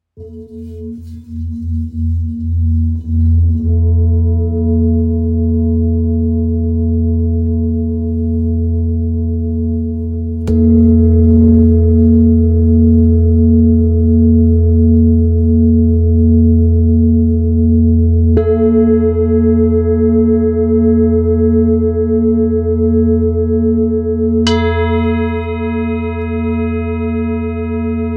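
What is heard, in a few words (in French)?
Bol ramgandi mi, bol chantant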